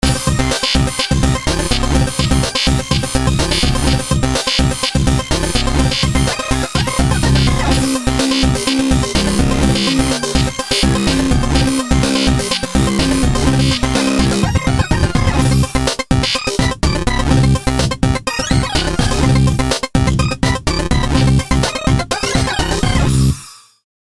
robofunk

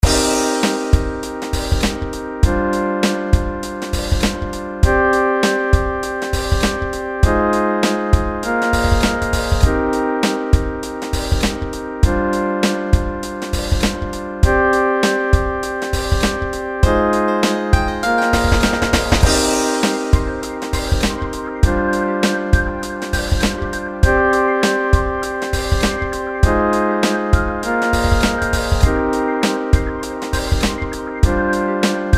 loop

